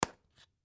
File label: Aftershave Remove; Blastwave